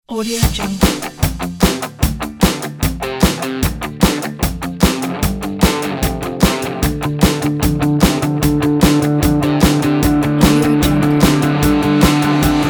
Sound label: energy bright and rock